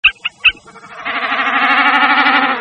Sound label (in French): Becassine Des Marais; Scolopacides; La Bécassine des marais